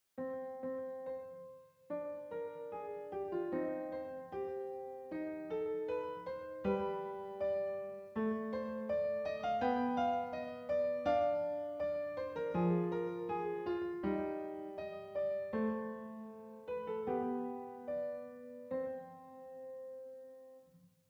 Piano

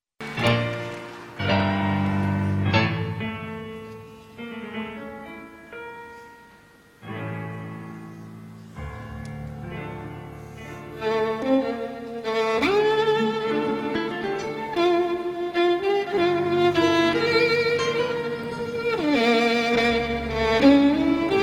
Csardas Hungarian Dance; Czardas; Hungary; A traditional Hungarian folk dance.

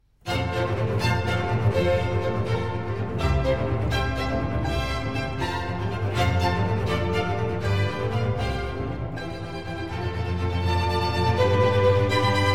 Concerto in Fa maggiore - Allegro; Vivaldi; Concerto ripieno

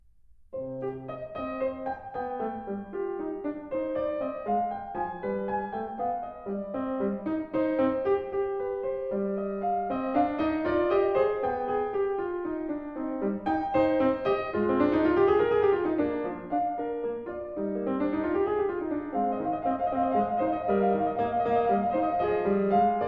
Sinfonia in c-moll; Bach; BWV 788

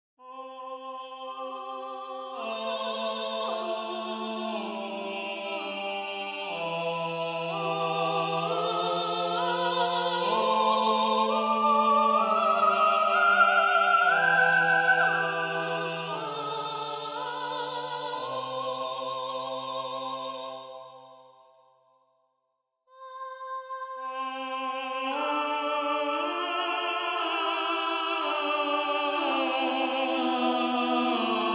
counterpoint; Artinfuser Counterpoint

